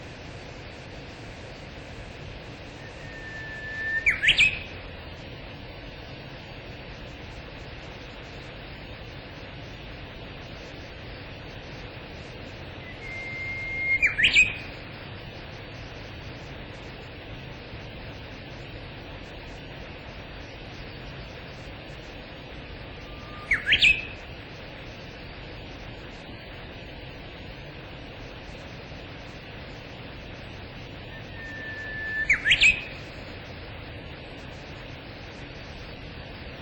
bush warbler

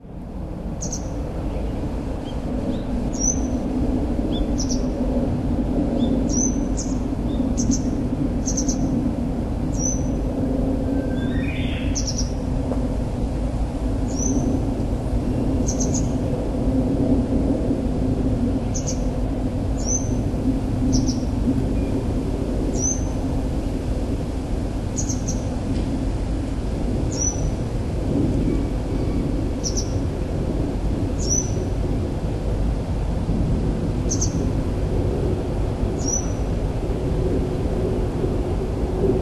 white wagtail

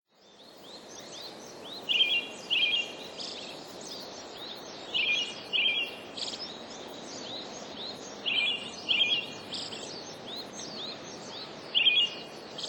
brown thrush

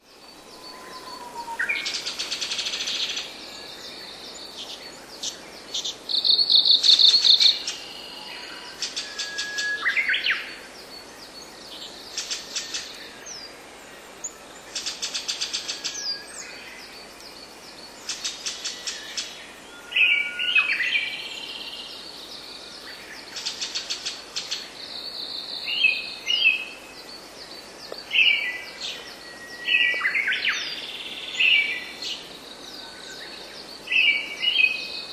brown thrush

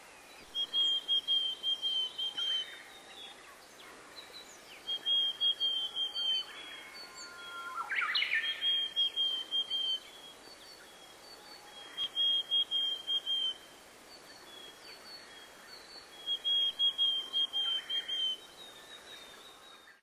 willow tit

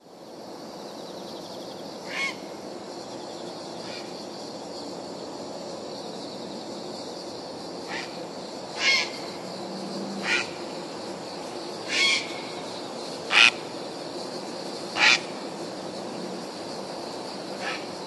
grey heron

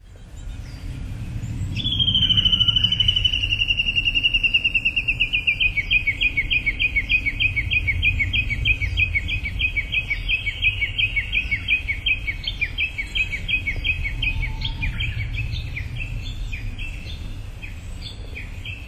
bush warbler

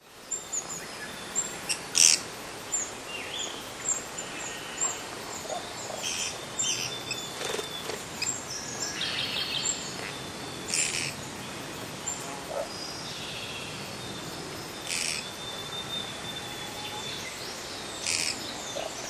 pygmy woodpecker

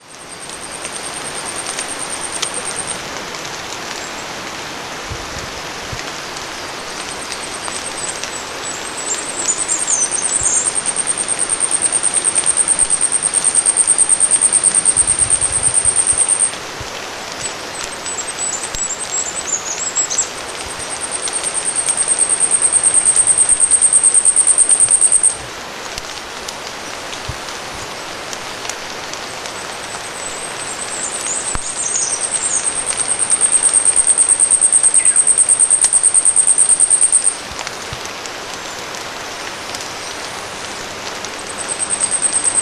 short tailed warbler

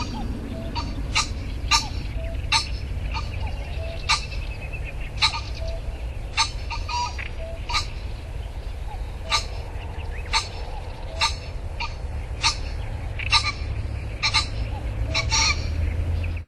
green pheasant

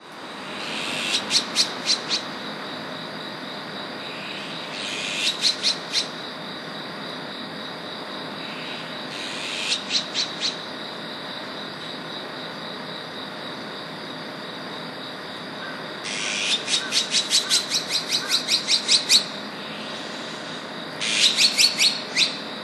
azure-winged magpie

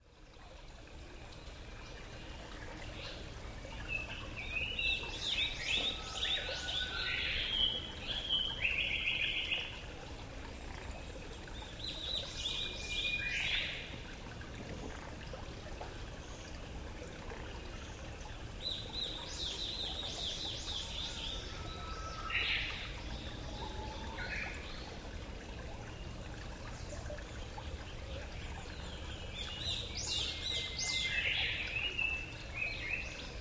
black paradise flycatcher

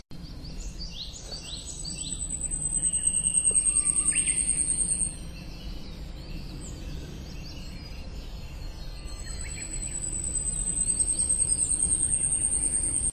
short tailed warbler

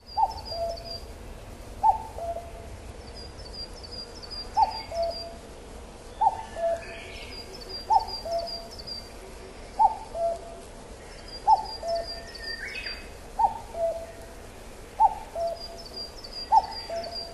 common cuckoo

